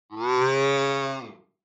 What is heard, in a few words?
Cow Synthetic